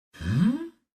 "hmm"; هوم

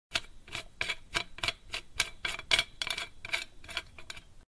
bolt and nut; پیچ و مهره

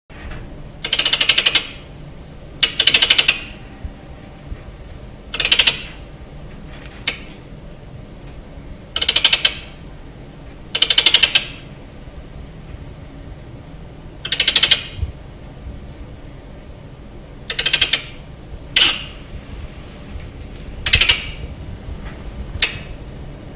Martens; سمور

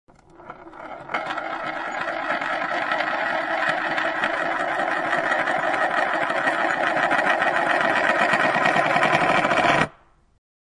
spinning top

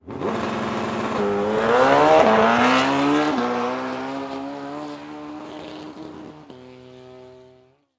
Motorcycle Drag Bike Exit

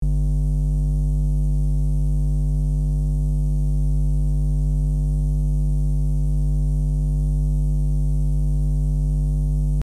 power buzz loop

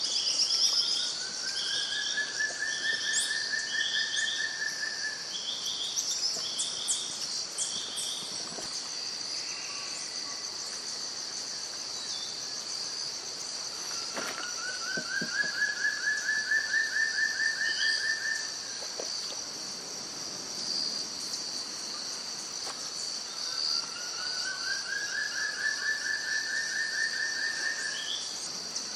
Frederickena, پرنده فردریکنا

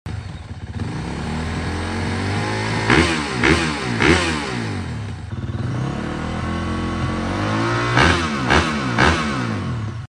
Yamaha Motocross Bike; کراس یاماها

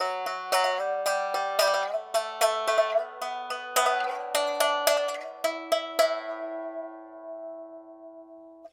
Am Rythm Pipa Lute

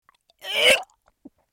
gag with liquid rising

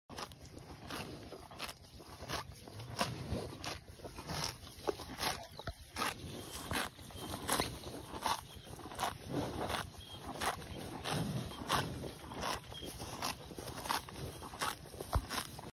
Cow eating grass; علف خوردن گاو

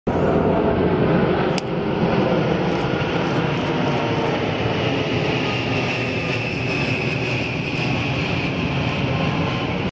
Antonov-An-124, آنتونوف 124

